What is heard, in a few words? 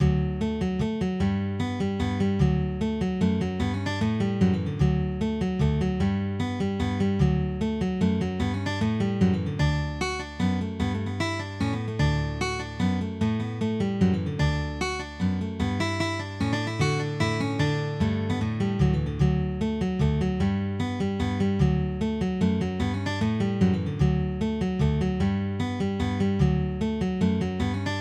Mazurka; Mazurka Gan Ainm